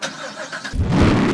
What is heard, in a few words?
start, impala